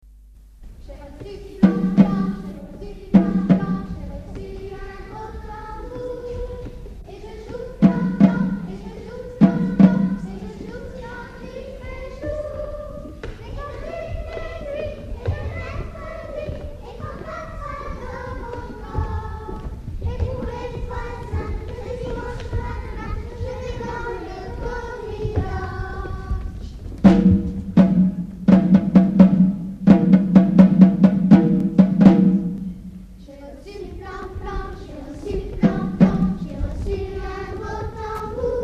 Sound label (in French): Tambour